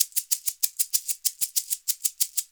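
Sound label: shaker egg low, percussion; ILIO; Synclavier Percussion 2; World & Orchestral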